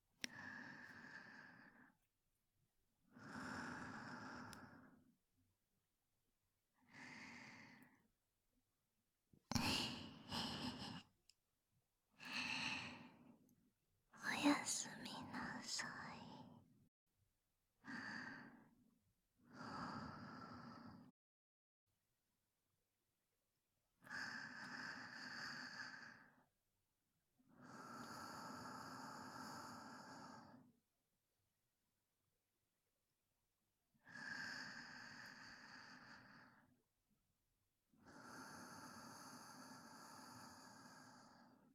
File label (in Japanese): 安眠吐息トラック (バイノーラルマイク); 効果音あり